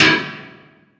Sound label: Oneshot; Sonic Foundry (Sony Creative Software); Esoterik Beatz; Spitter